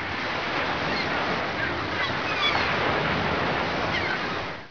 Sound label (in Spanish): gaviotas